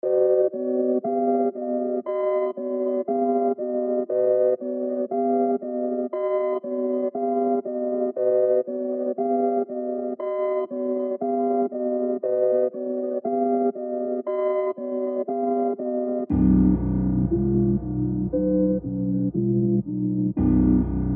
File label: Slow Drill